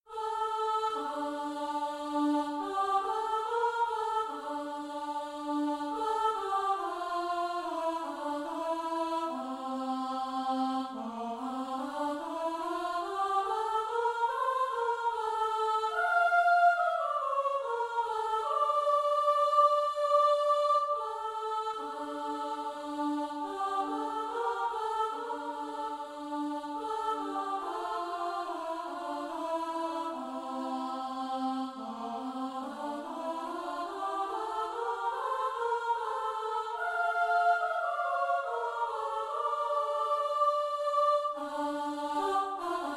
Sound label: Ani Maamin in D minor